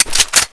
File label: bolt pull, weapons; czero opforce